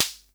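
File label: SR95 snare drum; Best Service; Dance Mega Drums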